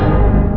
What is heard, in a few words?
COUNTDN; STAR TREK